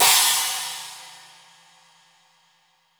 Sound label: crash single shot D key, Royality free drum crash tuned to the D note. Loudest frequency: 6302Hz; single hit